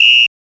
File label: TR-727 WHSTL LG, Drums